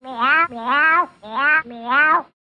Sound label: vent cat